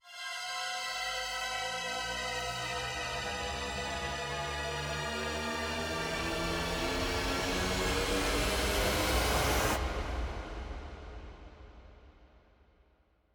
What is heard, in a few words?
tension